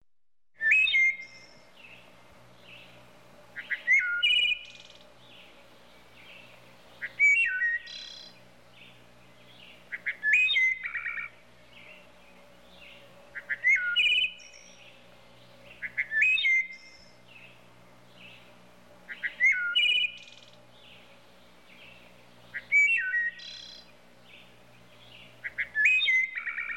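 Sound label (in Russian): дрозды древесные лесные дрозды крики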